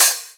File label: Open HH, Cymbals